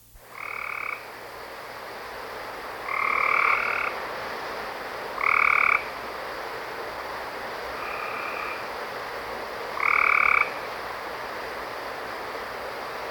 southern gray treefrog rain call

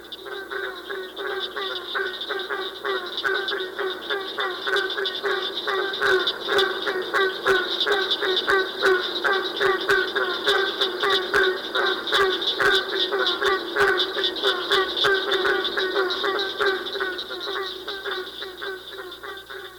barking treefrog chorus

